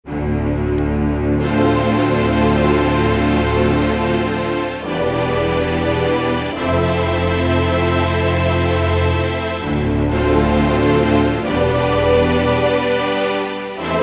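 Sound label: Vocal Strings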